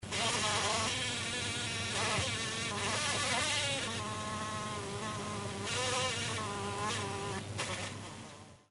mouche, sons nature

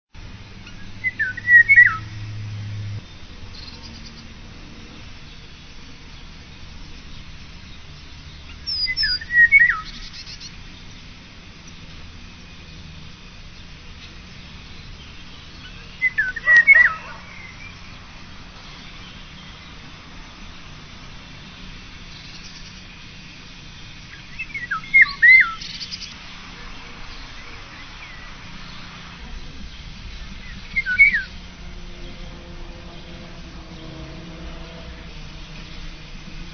Loriot, sons nature

